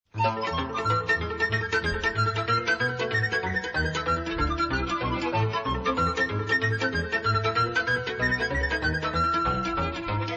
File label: traditional romanian